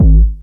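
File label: hard bass drums; Bazzdrumz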